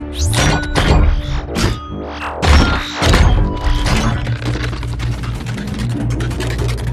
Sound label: Transformation